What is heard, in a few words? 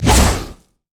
pounce; nidalee base